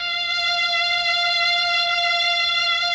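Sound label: Mellotron strings; Sonic Reality; Vintage Timetraveler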